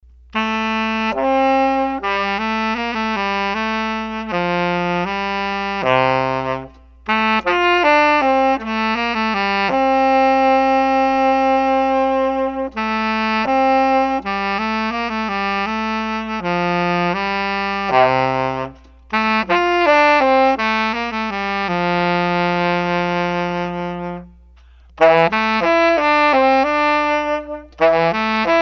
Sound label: Tenor Sax Solo Only; Solo Accompaniments; Two Little Tales